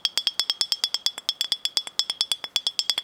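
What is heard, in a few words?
petuh rooster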